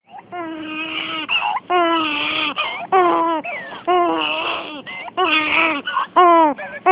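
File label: baby hungry, female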